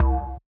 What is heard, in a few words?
Jacks Bass, Old Skool House; Big Fish Audio; OSH Bass